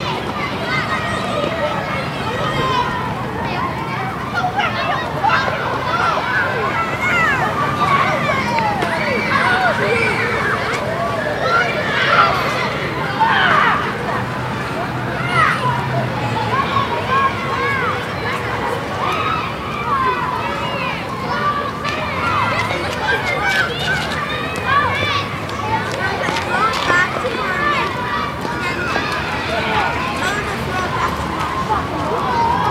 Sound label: Children Play On Play, kids play